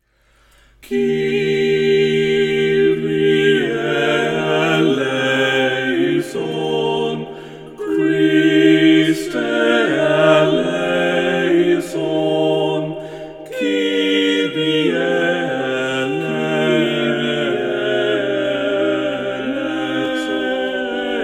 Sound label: Kyrie, mass for three voices; byrd; eucharist settings